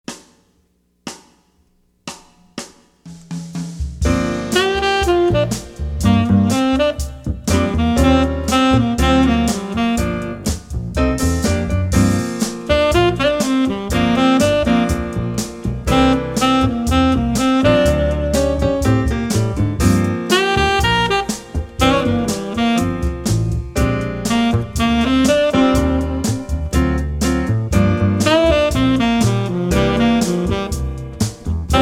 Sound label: Funky, trumpet; 15 Easy Jazz Etudes; CM Level 3 Solos (jazz)